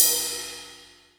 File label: tremelo, cymbals